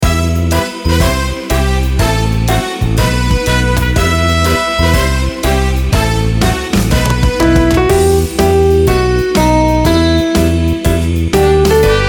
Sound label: karaoke